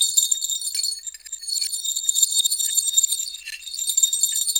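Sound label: Tanzania bells; Roland LCDP14 Africa VOL-2; Perc FX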